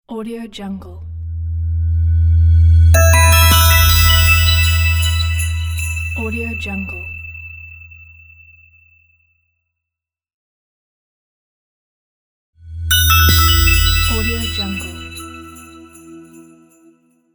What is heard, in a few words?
christmas logo